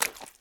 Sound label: mud walk, step